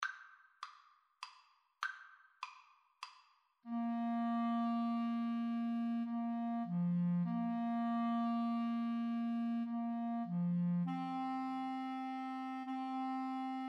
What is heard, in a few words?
Clarinet Duet